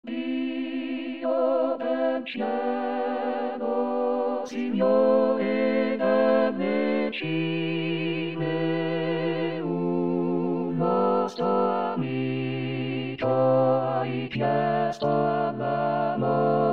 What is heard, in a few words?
bass